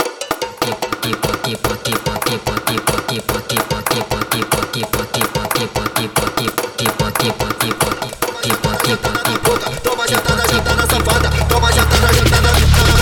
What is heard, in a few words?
Brazilian Groove